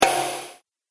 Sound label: Hit With White Noise; Static Sound Effects